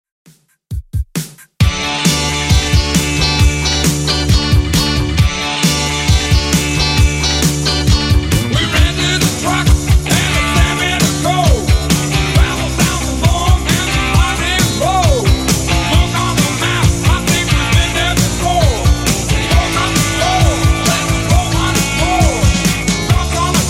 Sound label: 70s Rock Redrum 134bpm